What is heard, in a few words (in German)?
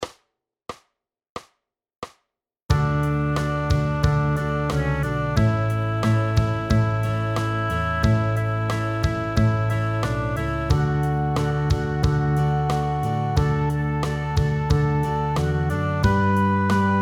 drei chinesen gitarre